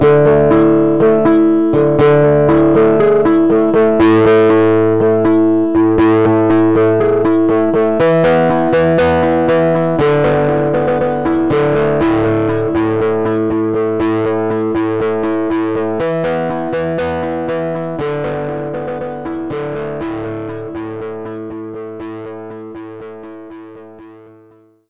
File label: guitar amp